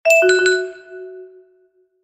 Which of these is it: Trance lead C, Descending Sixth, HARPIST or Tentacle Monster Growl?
Descending Sixth